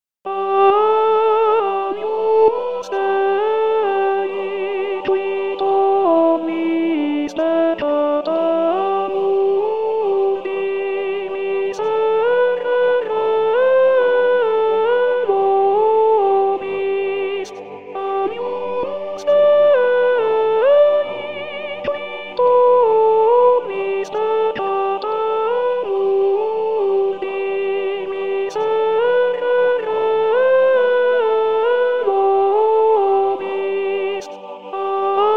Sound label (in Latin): Missa VIII de Angelis Agnus Dei Coro